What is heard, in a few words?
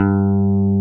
G2 BASS